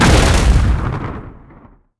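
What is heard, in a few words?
boss explosion, monster; steamer crazy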